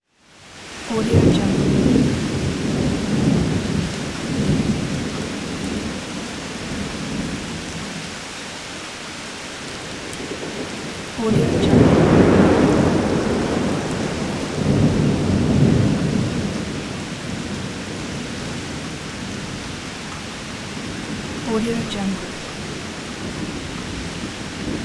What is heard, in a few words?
thunderstorm